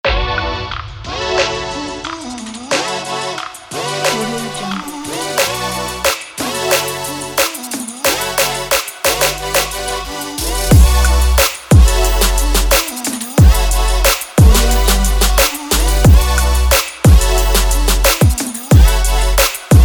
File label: future bass